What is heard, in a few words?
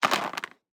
DICE on Cardboard, Throw and Roll, Standard, 16 Sixteen Dice; Samples; ArticulatedSounds; DICE Sfx Party (Extended)